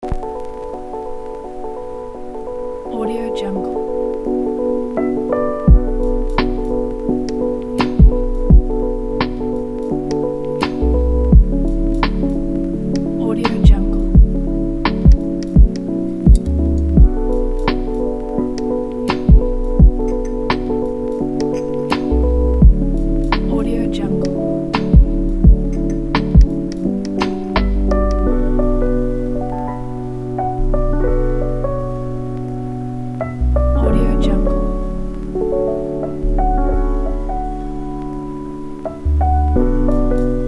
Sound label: lofi trip hop lounge